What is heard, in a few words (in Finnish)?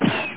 kanuuna; ampubeta